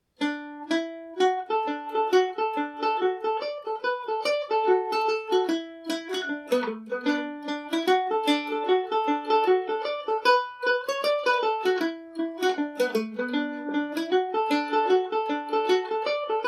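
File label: D major, irish mandolin; lady anne montgomery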